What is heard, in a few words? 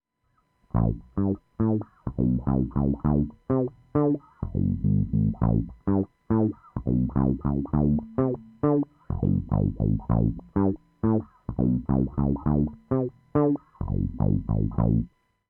wah